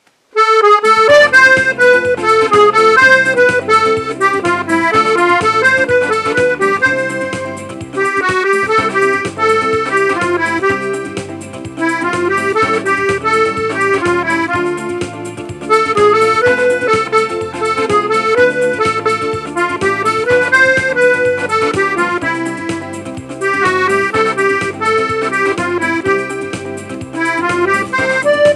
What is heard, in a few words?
samba